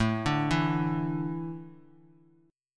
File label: A Suspended 4th; Asus4 arpeggiated; JGuitar